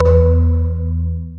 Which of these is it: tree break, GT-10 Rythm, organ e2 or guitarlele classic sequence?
organ e2